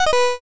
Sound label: gacha lose